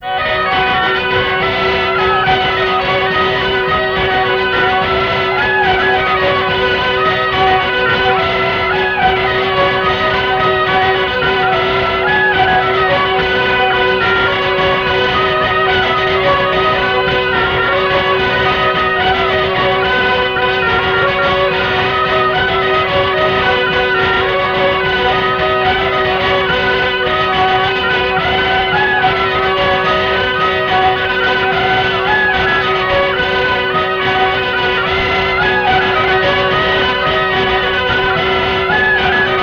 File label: alto